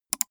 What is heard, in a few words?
gaming mouse left click single fast; maschinen; buero